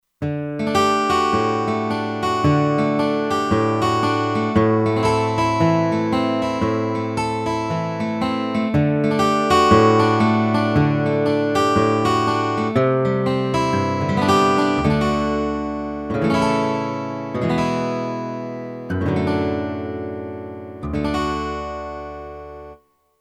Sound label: Acoustic Guitar, Kurzweil K2500X; Factory Programs